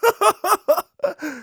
laugh happy; Scout

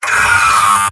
Medic pain severe

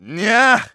Medic negative vocalization, de

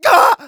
Scout pain severe, de

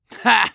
laugh short

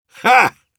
laugh short, ru; «Ха!»; Heavy

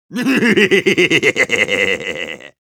Soldier laugh evil, ru; Злой смех 2

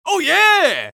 Scout taunt conga fun

